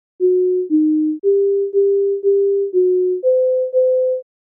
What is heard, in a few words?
melody